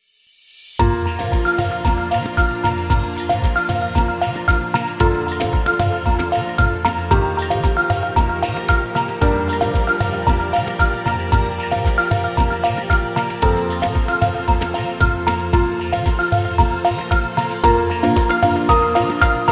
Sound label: India Upbeat Travel